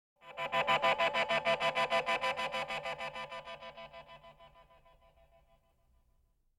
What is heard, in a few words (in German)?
ufos vorüberfliegender weltraummüll; Science Fiction